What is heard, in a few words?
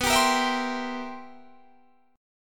C 7th B, C7/B chord; strummed